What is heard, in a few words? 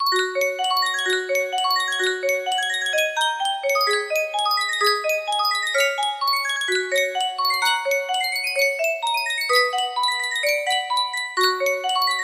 Sankyo custom tune music box